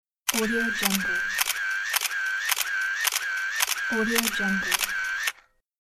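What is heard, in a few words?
camera slr with the autoshoot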